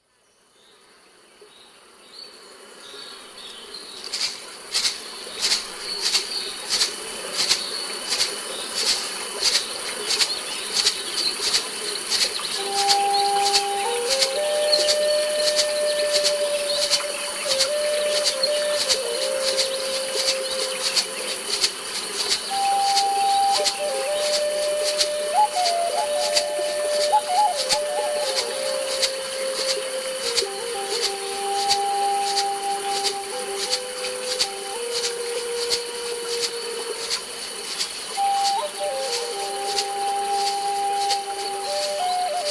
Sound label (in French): celebration musique chamanique tambour